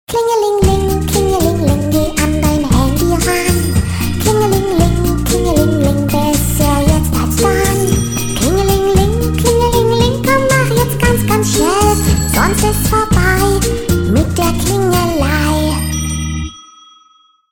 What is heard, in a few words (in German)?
Klingelingeling, ringtones fun fx; ghia productions